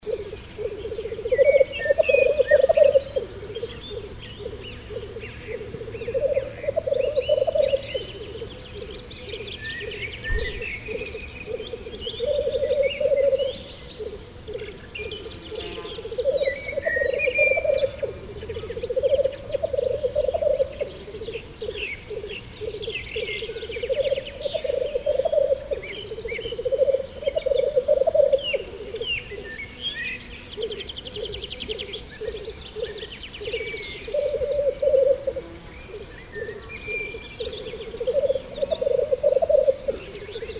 Birkhuhn; Susanne Rieck

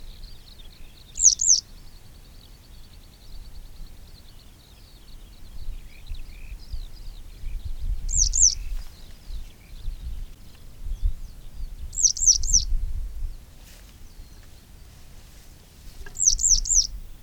Maskenschafstelze; Grucking ED; Joerg Langenberg

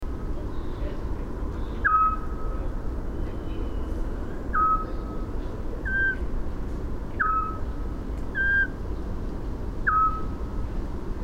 Zwergohreule; Landshut LA; Susanne Rieck

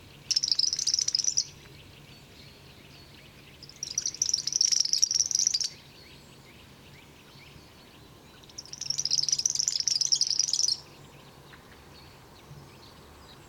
Feldschwirl; Kaeufelkofen LA; Michle Peron

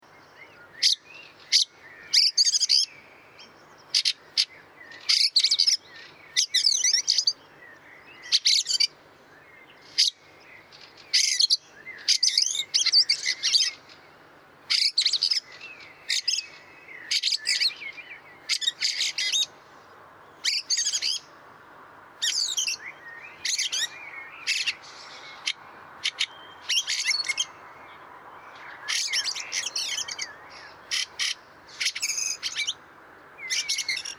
Wacholderdrossel; Wank GAR; Michle Pron

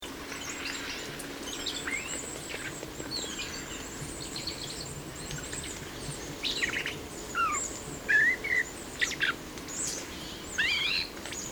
Amsel; Kranzberg FS; Michle Peron

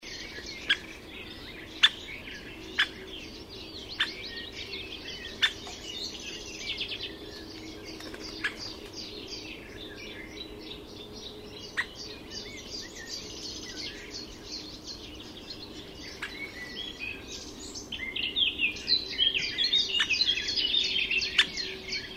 Weissrueckenspecht; Murnauer Moos; Susanne Rieck

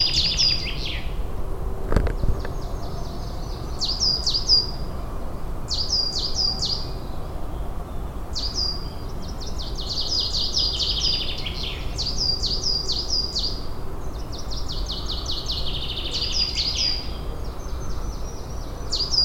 Tannenmeise; Guentersleben WUe; Rainer Jahn

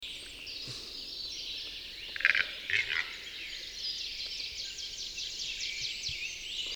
Auerhuhn; Bayerischer Wald; Susanne Rieck

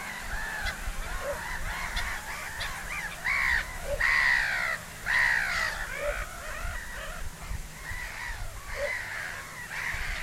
Zwergdommel; Mohrweihergebiet ERH; Barbara Goldmann

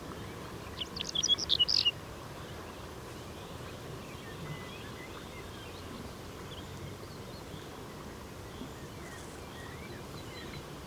Braunkehlchen; Murnauer Moos; Michle Peron

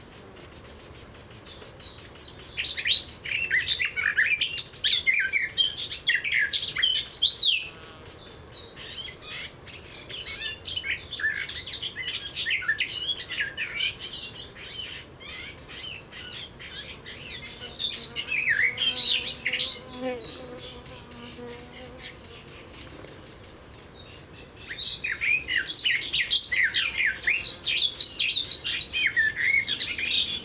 Gartengrasmuecke, Murnauer Moos; Michle Peron

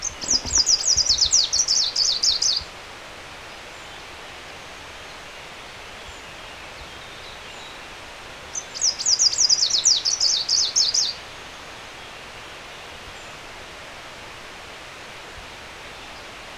Gruenlaubsaenger; Bayerischer Wald; Ingo Weiss

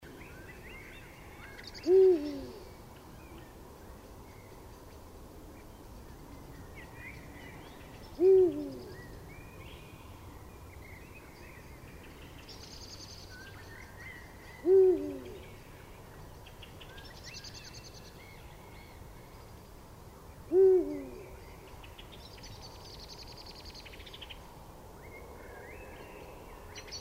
Uhu; Landshut; Susanne Rieck

